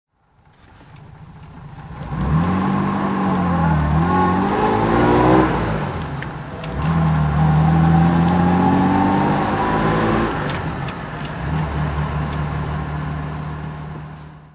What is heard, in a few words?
jag xk150s drive